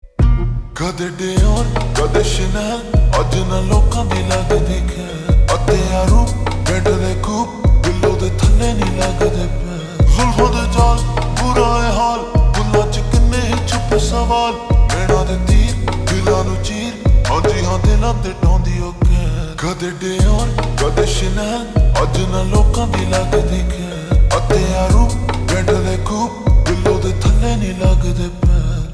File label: Ringtone Slowed Reverb